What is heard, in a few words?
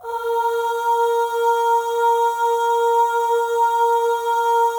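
OH, female OHS, Voices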